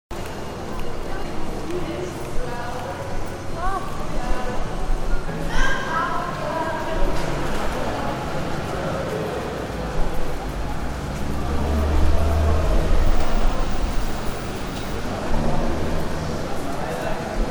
Pedestrian Subway, Underground pedestrian subway ambience.; Cities; Backgrounds